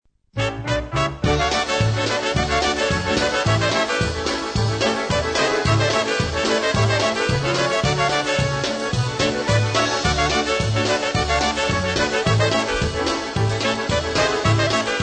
POLKA

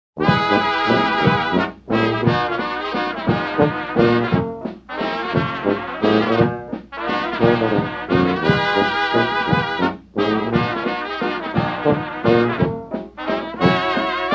WALTZ

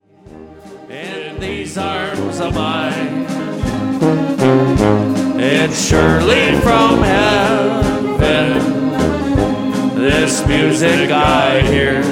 Waltz

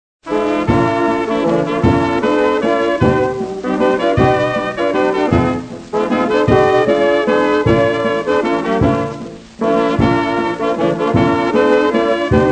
waltz

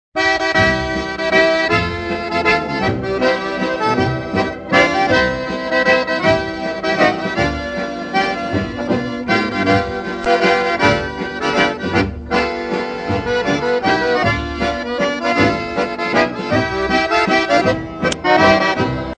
waltz

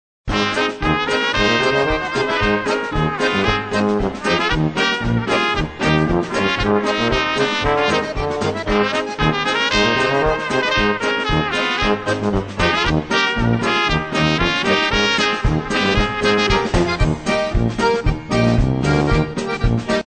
ROCKIN' RHYTHM POLKA

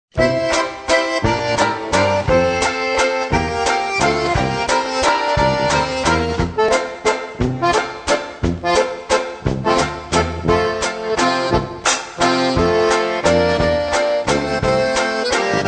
waltz

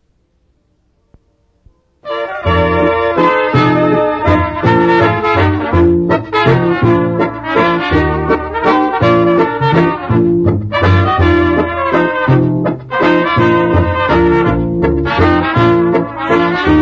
Honeymoon waltz

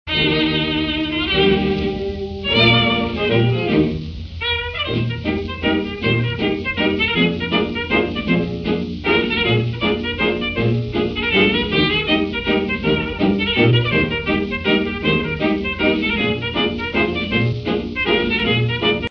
CESKY LAENDLER

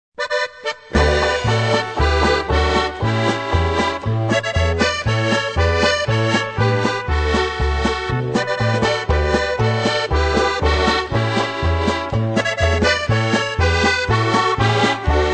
BUTTON BOX DELIGHTS

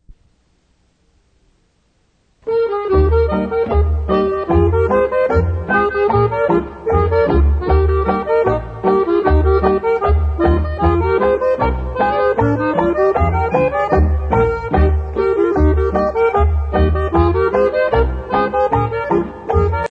Moonlight schottische

